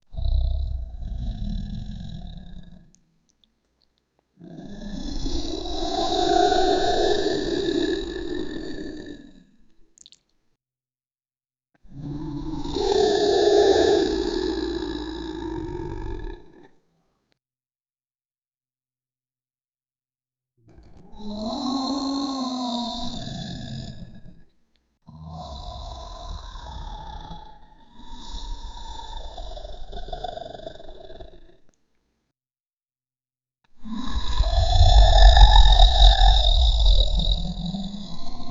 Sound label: two real life dragons fly, dragons